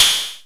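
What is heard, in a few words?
MS20 HAT OPEN; INIS - Funky Xtreams; MS-20 KIT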